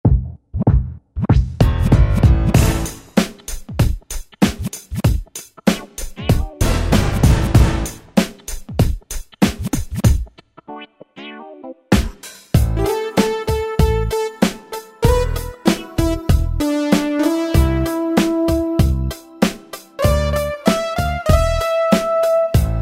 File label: US Pop, Dance; Yamaha PSR-3000; Factory Styles